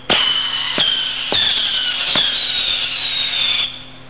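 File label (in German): Böller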